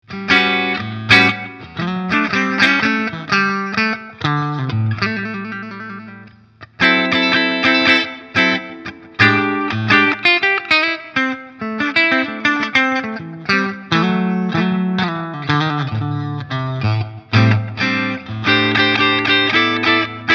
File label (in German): beide Pickups parallel